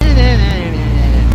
murmur; roblox

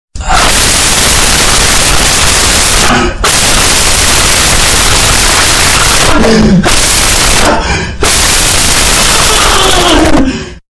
screaming man bass boosted

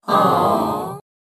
aww sad

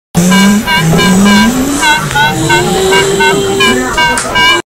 alt eas alarm; saint kitts et nevis

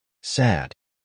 sad crab

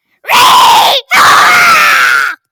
ahhhhhhhhhhhhahahahhahahahha

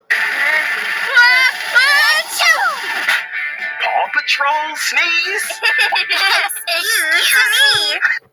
sneeze; noggin knows paw patrol

